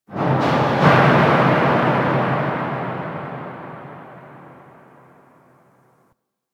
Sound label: Metal One Off; Hub; Steel Meridian; Warframe; Tenno